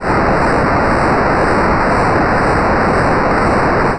Noise Classic

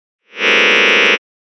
cluster closed semi D

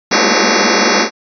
cluster open sixth

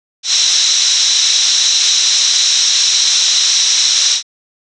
Noise Palatal

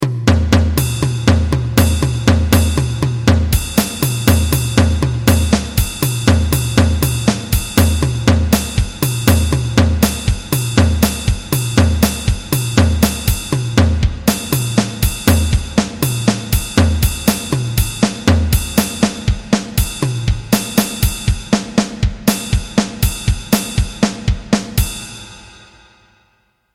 Feedback Evolution

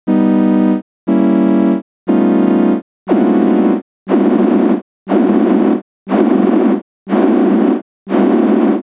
cluster rounded

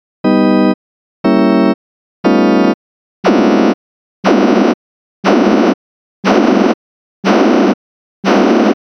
cluster muted octave

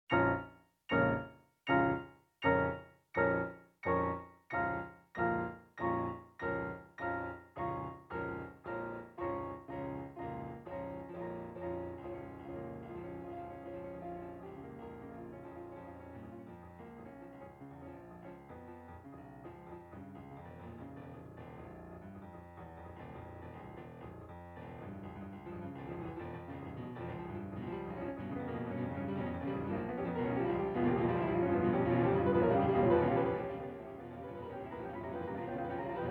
Toy Harmonium

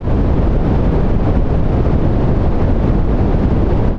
Noise Pink

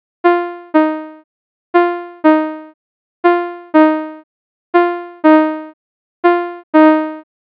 Envelope Holds

